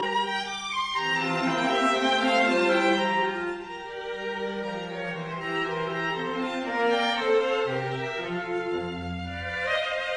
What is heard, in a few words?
String Quartet No 17 in B flat major Minuetto Moderato; Mozart; K 458; Hunt